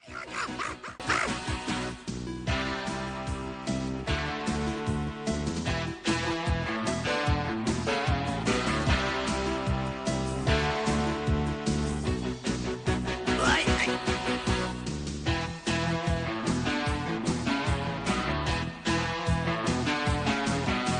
Instrumental

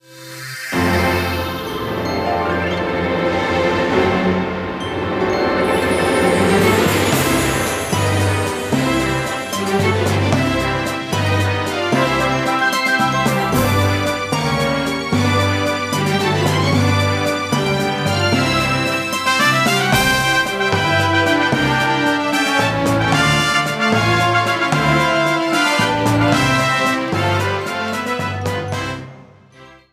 Underwater Theme; SSBB

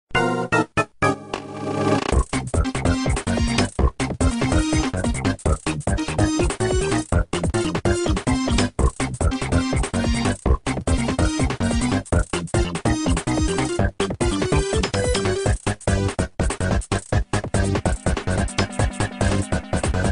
Music Fortress Boss; NSMB

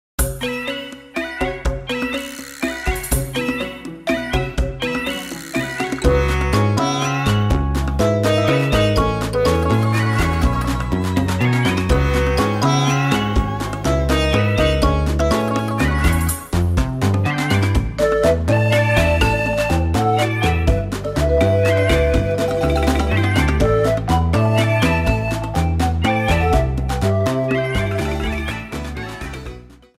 Lava; SMBW

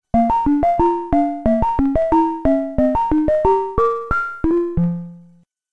Arcade Stage 1 Clear Theme; DKJ

